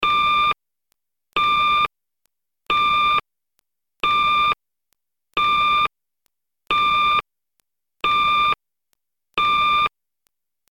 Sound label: Batphone